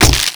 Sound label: Brawl Knee Kick A; Warframe; Brawling